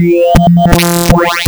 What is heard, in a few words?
PPG wavetables; waveedit256